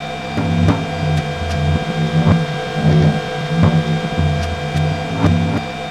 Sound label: INDUSTRIAL; ENVIRN-AMB